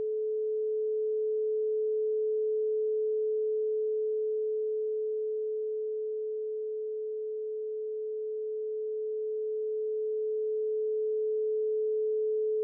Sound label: bg music; CIP L&O Online School